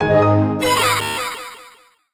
Retry Jingle; Friday Night Funkin' Ultrafunk Vocalized Violence

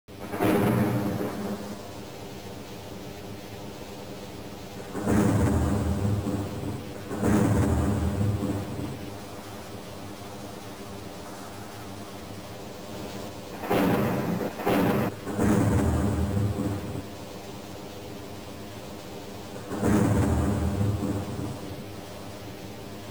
Intro Thunder

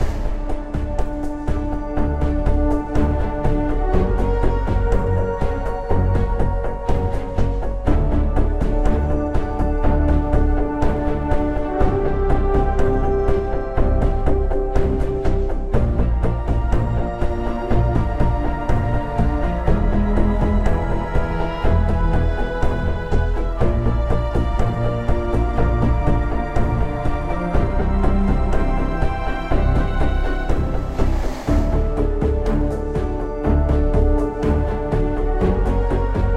Login Loop; FN Login Remix

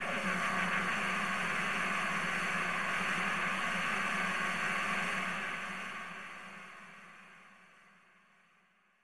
Storm; Dai Koukai Jidai 2; Uncharted Waters New Horizons; SNES

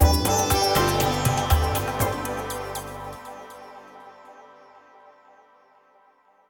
win stinger; mokoko x; Saraswati

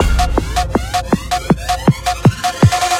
Boss floor intro

